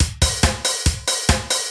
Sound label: train